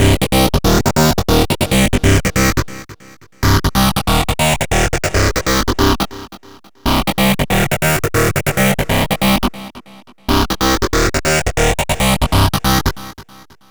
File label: 140 House Arp Cmin; Pro Tools Essential Loops; Dance DJ; Synth; Arps